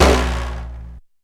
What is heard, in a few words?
TRUMM